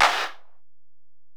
EPerc, Alesis DM-PRO

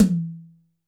D3 - Rock Tom, Yamaha PSR-E323 Rock Kit

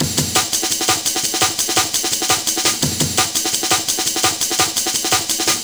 Kickin Break, Drum & Bass, Beats & Breaks; Fluid Dynamics

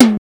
Ensoniq EPS Tom

